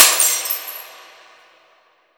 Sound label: glass break